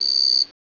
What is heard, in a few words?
cricket; valve; ambience